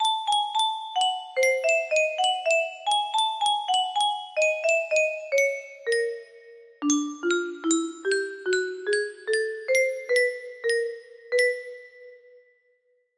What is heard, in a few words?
melody